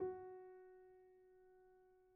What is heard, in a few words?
F#4, Grand Piano; bitKlavier Grand; Piano Bar